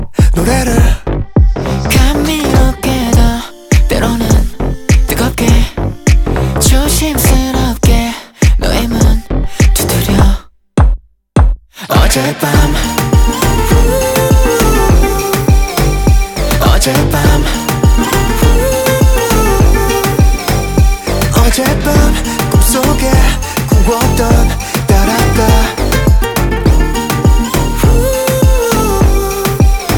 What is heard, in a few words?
Guitar